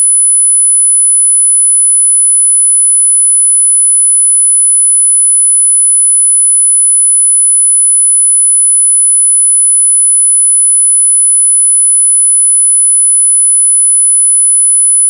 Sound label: 10kHz 44100Hz